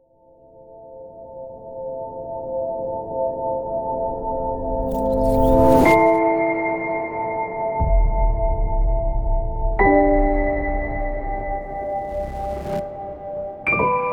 Cinematic Piano Trailer Score With Effects

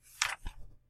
Page Turn

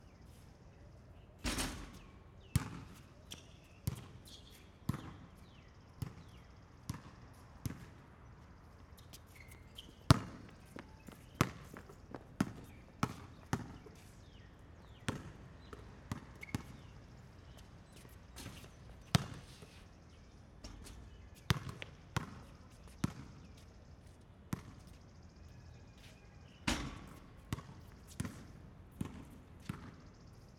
Basketball Exterior; Neumann RSM191

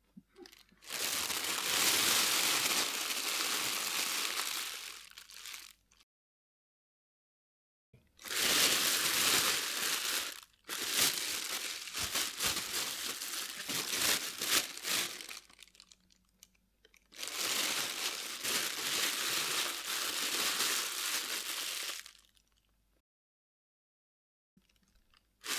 Bag Crunch Plastic Bag Crunching

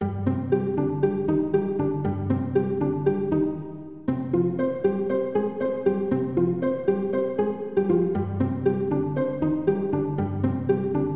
Plucked

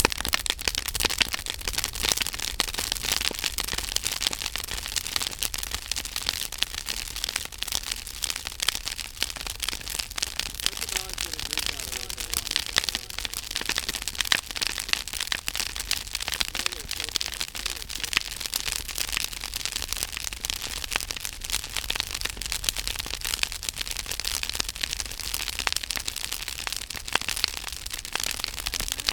Campfire

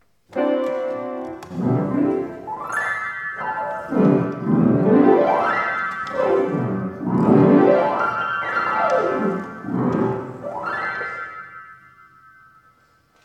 Old Piano Rolls

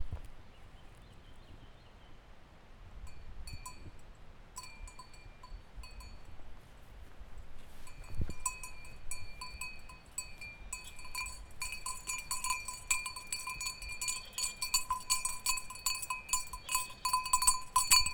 Goats

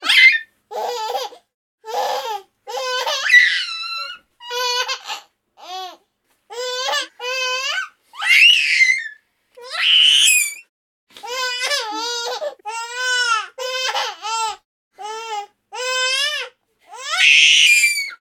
Baby Giggling

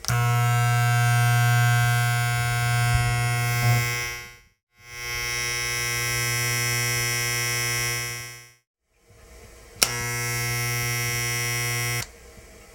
Shaver

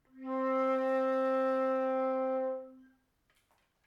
Transverse Flute C 4 Tenuto Non Vibrato

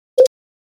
Bleep

